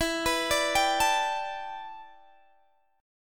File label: E Minor 11th guitar chord, Open G